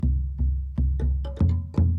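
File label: Calm Far, music; from dust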